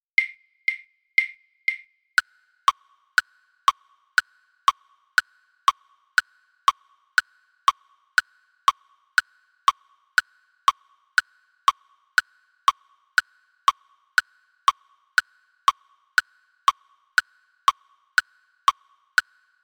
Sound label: polka click